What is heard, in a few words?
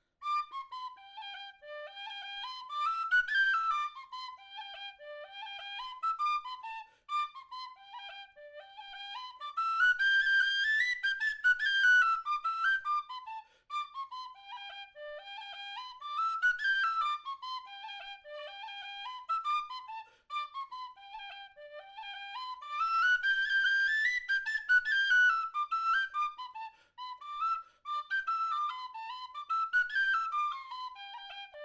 Honeymoon Reel (whistle)